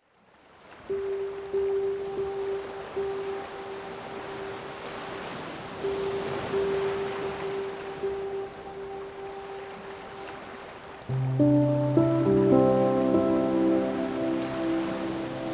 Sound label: piano, drift off with ocean noises